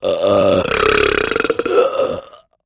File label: Extra Lrg Two Tone, belching